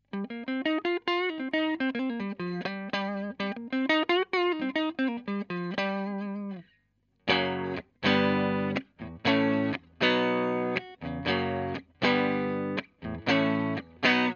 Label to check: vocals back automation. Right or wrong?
Wrong